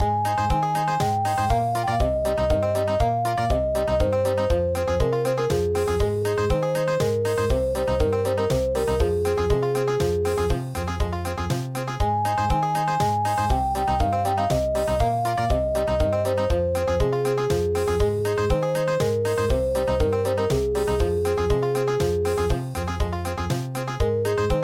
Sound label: chakuri country